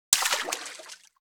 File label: splash